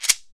SHEKE, Afro Shakers, Shekeres

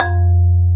Bass Marimba

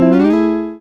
GTR-L, Funky GtrLicks; E-MU Formula 4000 Series Vol. 1; Hip Hop Nation

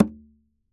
AMB, Congas

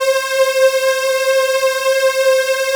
OB BIG P; Synclavier Sampler Library

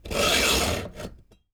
STEEL, SAWING; AKAI S-Series CD-ROM Sound Library VOL-6

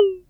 SYNCUSSION

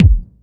Punch Kick; Kick Snare Layers; Drum Bits; layering

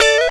D strum; Mo' Phatt; Digital Sound Factory Mo' Phatt

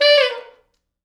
T Sax falls, Horns Sax

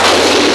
XPLODE

